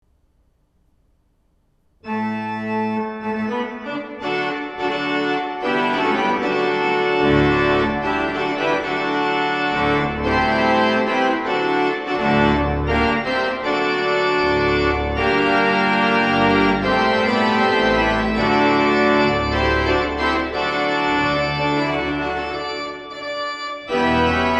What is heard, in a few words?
Fanfare; op.85; C.S.Lang